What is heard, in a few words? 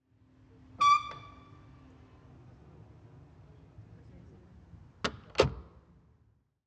front door squeaks and close